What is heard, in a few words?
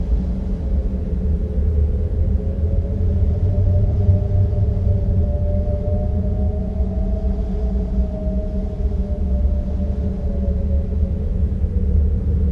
Wind mine